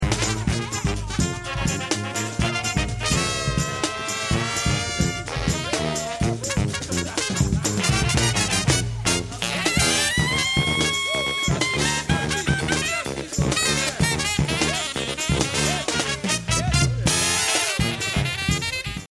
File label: 2nd line funk